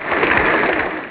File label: klaps